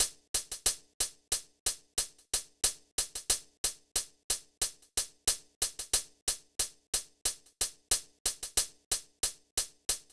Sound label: Drum Loop - 91 BPM Hihat, Boom Bap; Dreamers; Cymatics - ANALOG EVOLUTION Beta